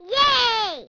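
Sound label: girl yay; Microsoft Graphics Studio; Home Publishing 99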